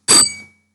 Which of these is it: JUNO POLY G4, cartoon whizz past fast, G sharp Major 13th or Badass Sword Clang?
Badass Sword Clang